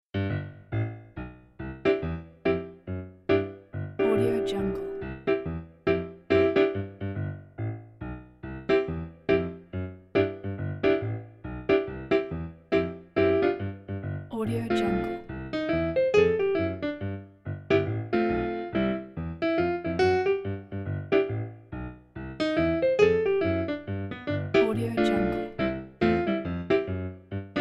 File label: jazzy